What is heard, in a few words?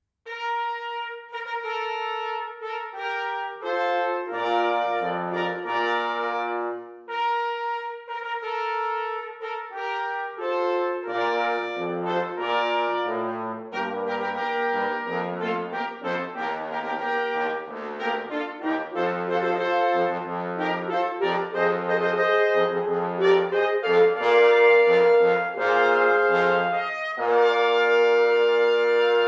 Fanfare, brass; Fanfare #4